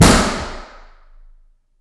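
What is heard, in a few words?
Lunch Room Center; Reverb; 29x37x12ft; 30ft; FL Studio 9; Fruity; Edison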